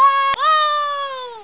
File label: spinout; gba; Toad; mario kart super circuit